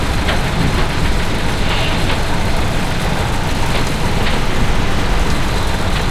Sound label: main rotor damage layer; engines; War Thunder